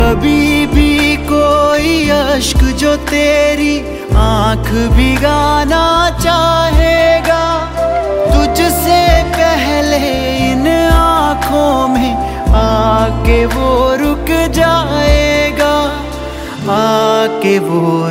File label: Ringtone